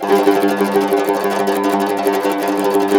CUMBUS Tremolo

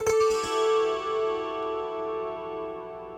Kantele Down; Sonic Foundry (Sony Creative Software); Friendly Fire

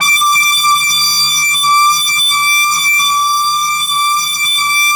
FLYER D5, Yamaha VP-1; Music Store; 90's Sample CDs

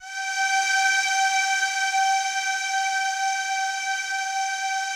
DEEP PAD G4 R, Yamaha VP-1; Music Store; 90's Sample CDs; DEEP-PAD 3

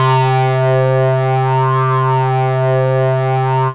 Synths Note C; House; Best Service; House Definition VOL-1

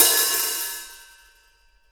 MIX HAT OP, NATIVE HI HAT

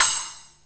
HI SPLASH, CYMBALS; Back In Time Records - Big Bang

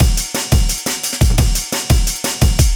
brain damage; Amen Breaks

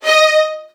D#2, Strings, VN 16TH; ILIO; Kirk Hunter

